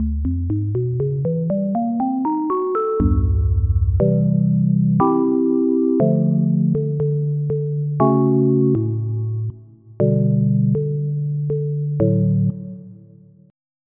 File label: Organ; Onj's Soundfont